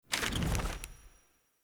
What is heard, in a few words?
UI Default Map Open